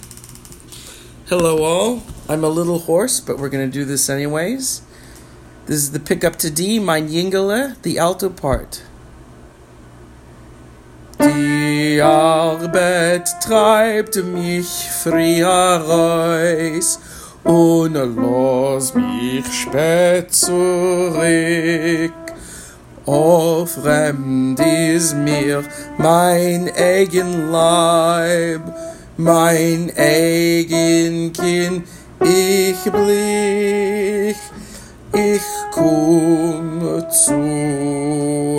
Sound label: Altos; Mayn Yingele